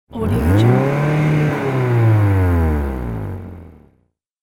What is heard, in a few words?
rattling car revving; urban sounds